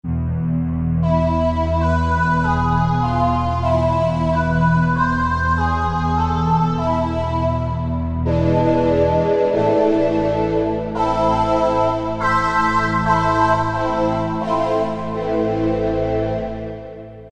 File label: Korg T3 Vocoder